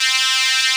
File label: PWM HP63 C3; Analogon